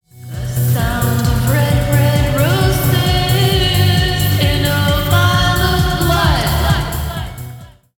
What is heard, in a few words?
loop